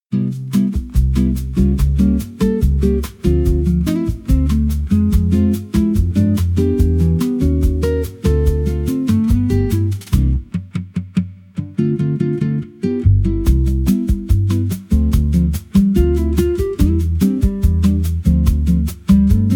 bossa nova acoustic for bright afternoon

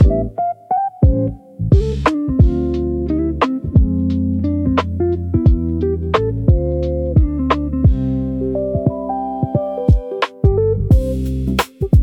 Sidewalk Sketches, lofi jazz hop

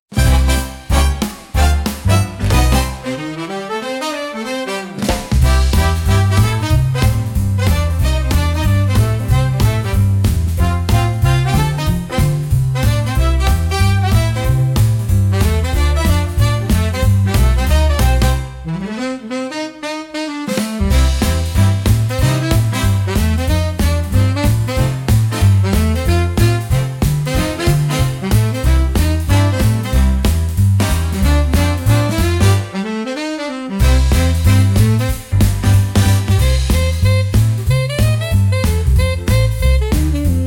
Showtime Swing, big band swing

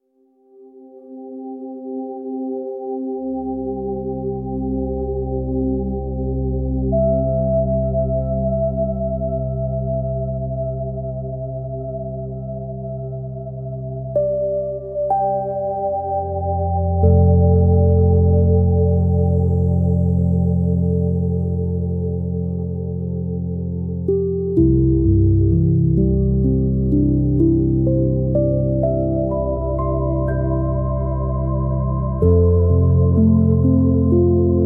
Forest Ceiling, fantasy music nature forest; ghibli style; wind healing nostalgia

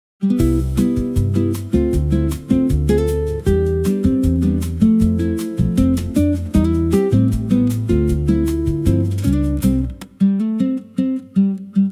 bossa nova acoustic for bright afternoon

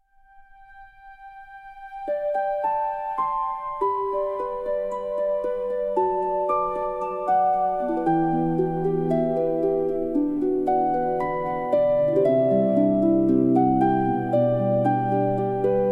fantasy music nature forest

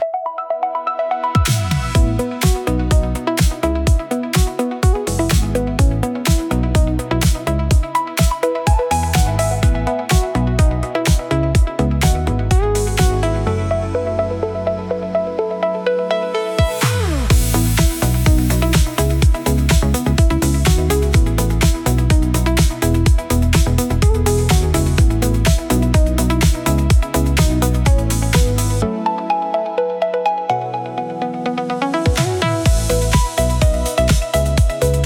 Bright Morning Brief, soft electro

